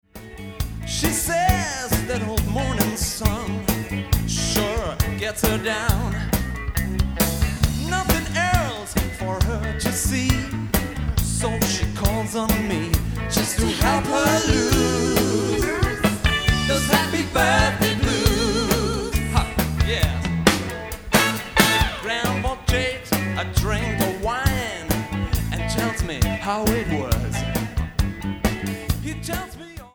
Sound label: Happy birthday blues